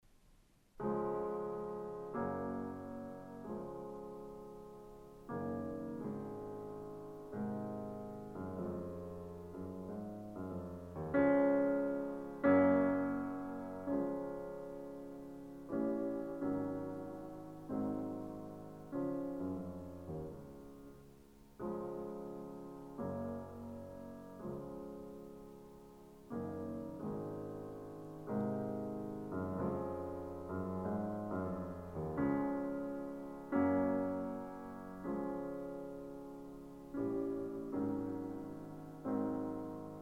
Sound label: Piano Sonata No. 23 in F minor; Wilhelm Kempff; Beethoven; Piano Sonatas